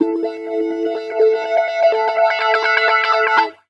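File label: guitar, Roots Funk; USB Soundscan vol.41; AKAI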